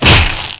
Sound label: melee; rogue; stalker